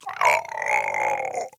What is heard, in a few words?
Grunt Hit; Paladins; Makoa